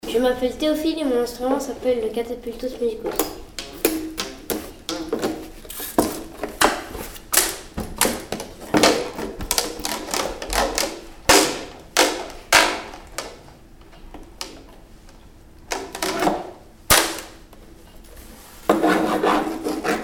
catapultos musicos

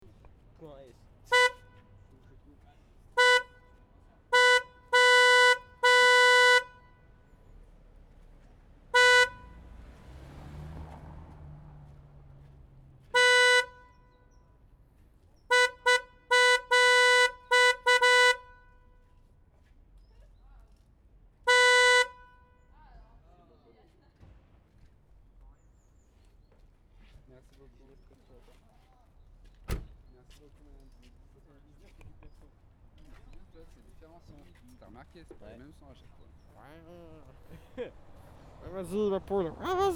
klaxon voiture

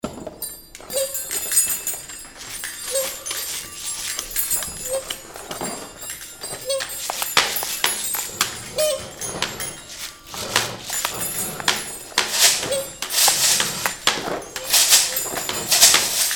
mini orchestre

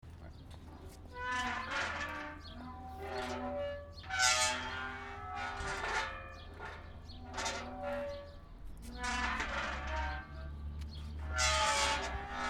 porte square grincement

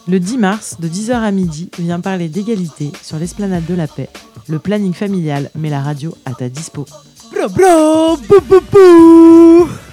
pub music

